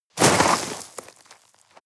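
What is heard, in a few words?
step gravel slip down debris short, footsteps; schritte; menschen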